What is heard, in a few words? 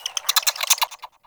Bird Clicky; Eidolon Plains